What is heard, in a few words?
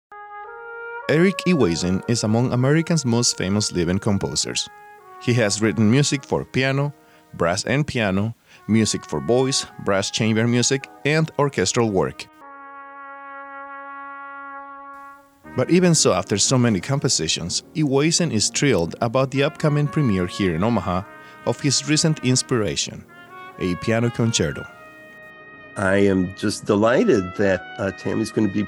Piano Concerto